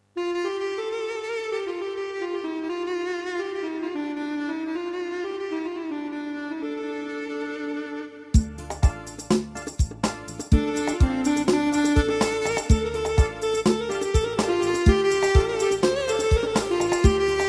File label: gem wk8 duduk